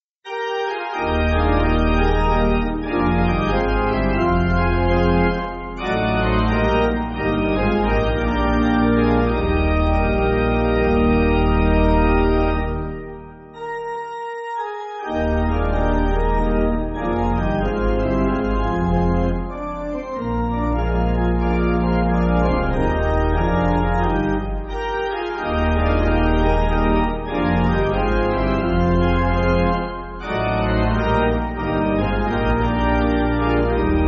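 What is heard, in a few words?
Pipe Organ; Eb; John T. Benson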